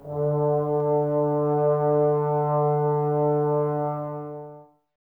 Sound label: BRS TBS D, Woodwind Brass Ensemble, Brass; Miroslav Vitous - Woodwind Brass Ensemble